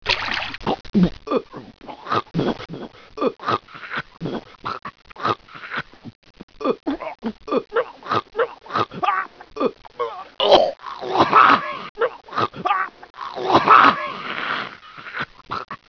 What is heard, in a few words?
choke, fighting